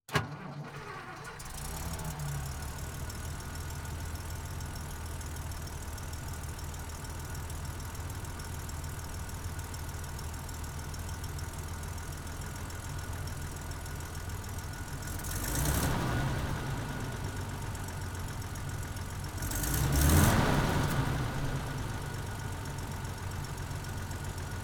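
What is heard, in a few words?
M8 onboard startup blips steady rpms in neutral shutdown engine, Greyhound Armored Car; Pole Position; PZM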